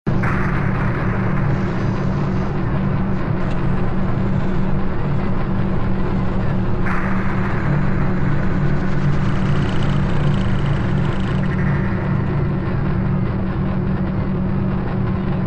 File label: sound design drone distorted glitchy; audio mangler